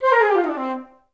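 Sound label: flute run down C3; Best Service; Peter Siedlaczek's Advanced Orchestra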